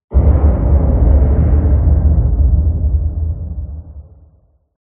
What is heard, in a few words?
impact underwater or space crash distant